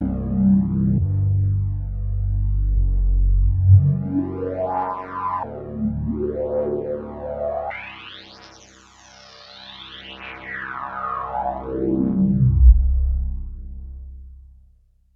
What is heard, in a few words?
bass; casio xw-p1